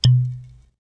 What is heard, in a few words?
bottle pop